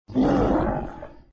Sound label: die